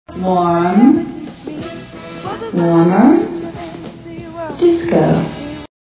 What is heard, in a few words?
DISCO